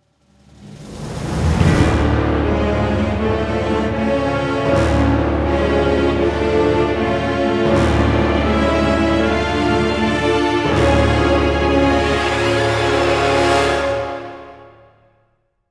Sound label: Bossfight Intensity Intro; Skellige